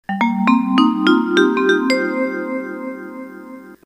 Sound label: vib